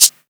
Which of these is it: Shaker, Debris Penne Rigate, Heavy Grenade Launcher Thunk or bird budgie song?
Shaker